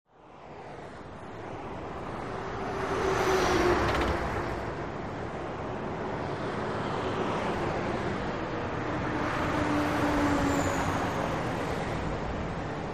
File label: Freeway Traffic, Car And Truck Bys, Tire Whine, Close Point of View.; highway